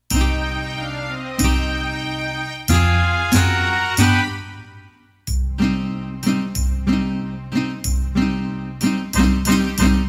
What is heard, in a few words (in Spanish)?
Mariachi